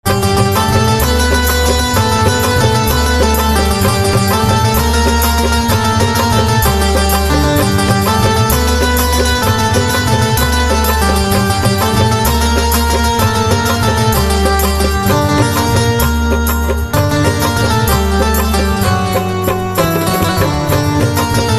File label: Banjo Instrumental Ringtone